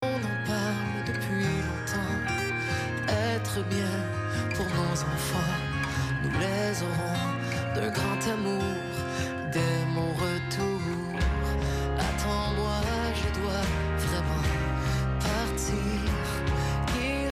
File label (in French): musique sur cable numerique; eyetv 250 plus; delgato